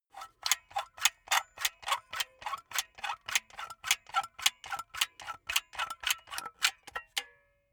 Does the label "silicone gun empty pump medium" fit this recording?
Yes